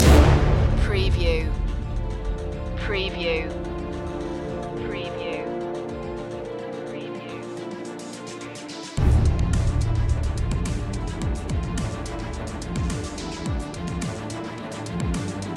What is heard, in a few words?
battle in 8-bit space